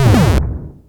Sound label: Banzai Bill